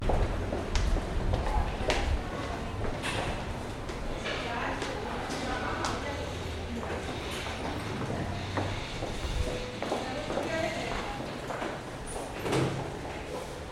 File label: Begin Metro, subway